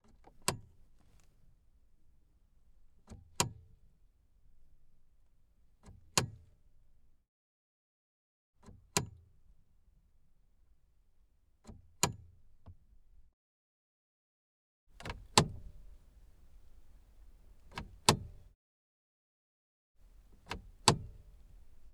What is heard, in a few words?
aston martin DB9 gear selector wheel; 2005; Pole Position Production; MS Decoded